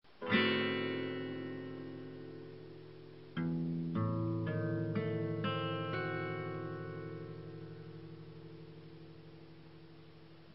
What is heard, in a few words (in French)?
Accord D-diese-dim